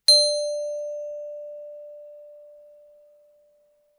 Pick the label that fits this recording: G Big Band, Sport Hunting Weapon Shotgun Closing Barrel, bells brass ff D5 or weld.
bells brass ff D5